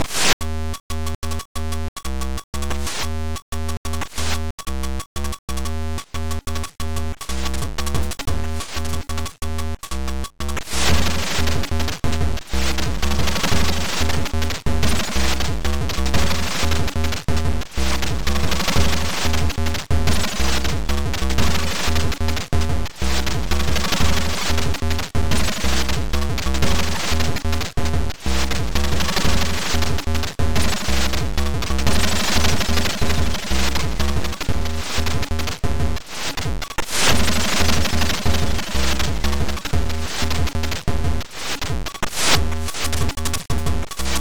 NOISE

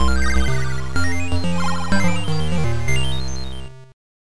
level begin; Waterworks 2

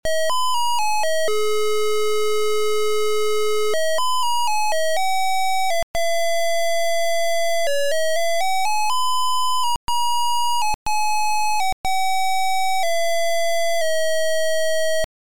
MELODY

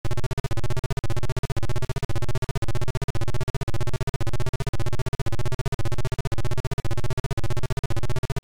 Intro Beeper

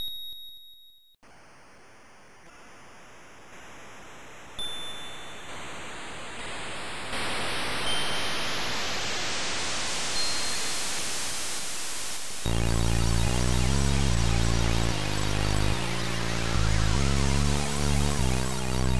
Horror

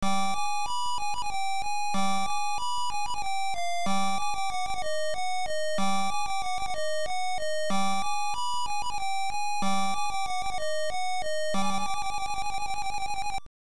Title Beeper; Stifflip & Co

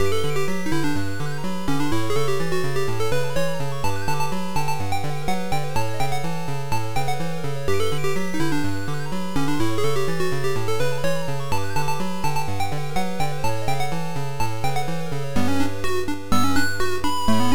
game music; Sophistry

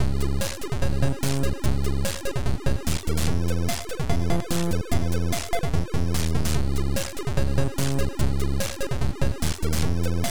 SadBallad

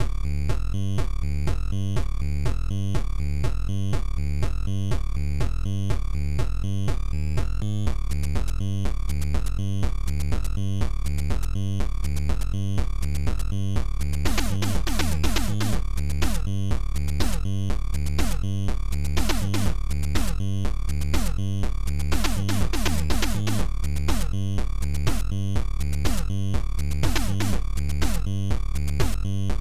TECHNO

